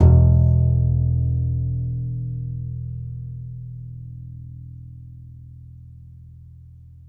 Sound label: C. Bass Balalaika, Plucked Strings